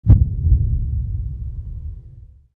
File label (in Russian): Ochen nizkiy zvuk ostanovki serdca ot straha